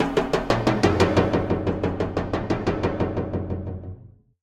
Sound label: 90 bpm beats; Torchlight III; PS4